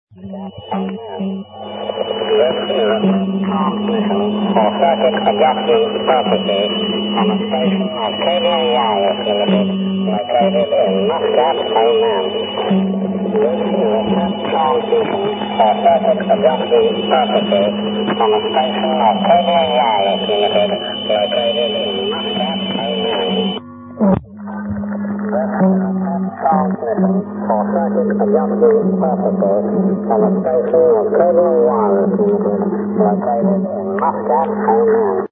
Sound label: usb